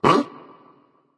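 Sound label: nonverbal surprised; smite; voicepack; merlin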